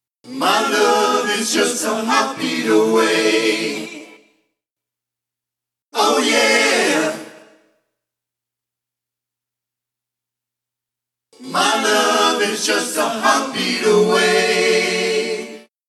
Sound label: heartbeat solo; Clifford Henricksen / New Kind of Recording